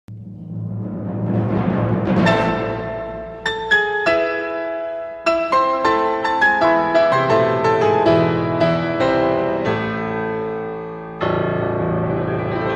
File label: Baroque music